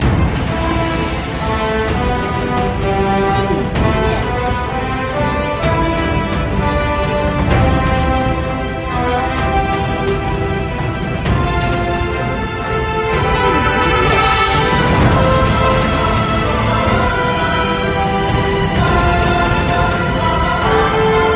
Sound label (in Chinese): 大气-磅礴-史诗